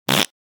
Fart Foley Short And Healthful; Hollywood Edge; sound designer's toolkit v2

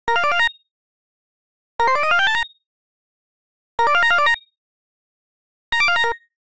Arcade Click Positive

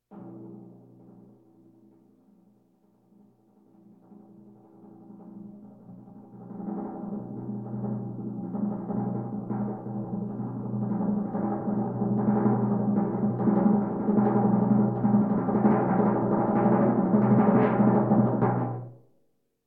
Percussion Bongos Tympani rolls Gongs Drums

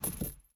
driver foley wheel turn; Blind Drive

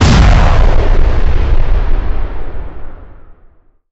Explosion; 1001 Sound Effects

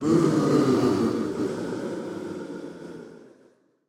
Crowd Booing; Pitch Black Audio Pong

